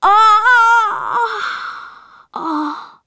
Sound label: death